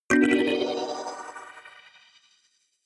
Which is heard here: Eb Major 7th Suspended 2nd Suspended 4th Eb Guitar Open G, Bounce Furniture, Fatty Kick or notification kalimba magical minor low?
notification kalimba magical minor low